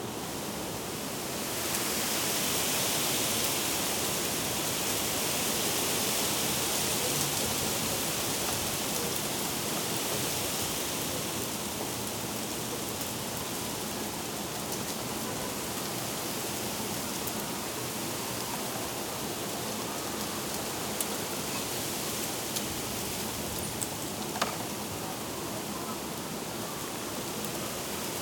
WEATHER WIND City Street, Wind in Trees, Strong, Foliage Rustle, Gust Wash, Subtle Creaks; Subtle Creaks & Noises, Montreal, Canada; Articulated Sounds; Nature in the City